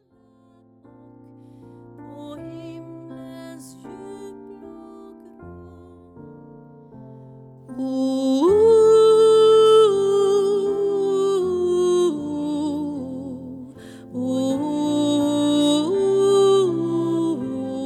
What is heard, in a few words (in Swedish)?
sopran; Nu tändas